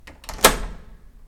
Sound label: Locking door